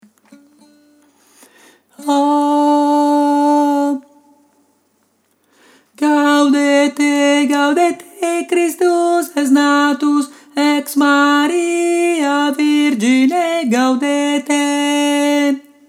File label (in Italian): contralti